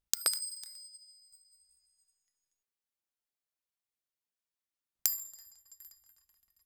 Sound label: single bangle dropfall so, jewelry